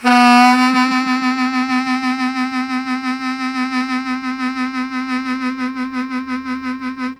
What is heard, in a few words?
Strong Vib w Scoop C2, Harmonica; Zero G